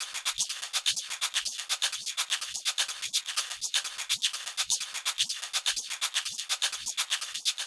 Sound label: Sh Fx Loop 125; Hh-Shake Loops 125; Equipped Music; Loungin House